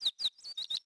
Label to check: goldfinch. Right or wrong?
Right